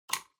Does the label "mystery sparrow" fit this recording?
No